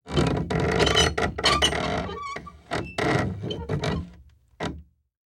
Household Gate Wooden Gate With Metal Parts Squeak